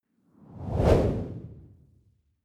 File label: whoosh simple hard long